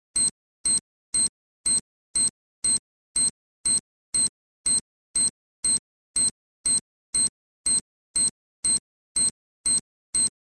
c4 beep

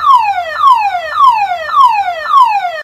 alarm

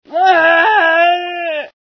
scream, female sci

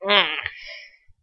mmph; w00tguy; stealth

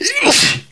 sneeze

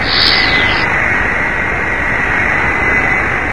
alert, sphere

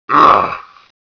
pain, babygarg

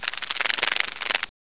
dig down; snapbug

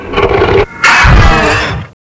claw attack; tubequeen

